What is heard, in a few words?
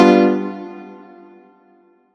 soft hit whistle